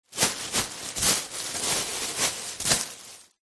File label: plastic packaging sheet short handling movement stuffing; foley